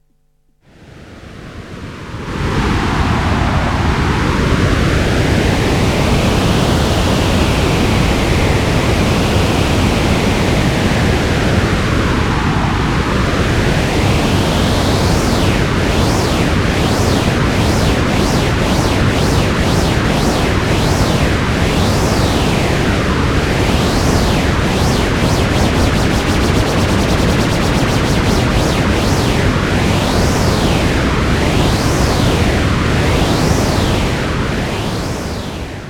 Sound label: phase shifter white noise